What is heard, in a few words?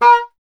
E. Horn